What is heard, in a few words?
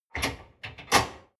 dishwasher frame fold down; maschinen; spuelmaschine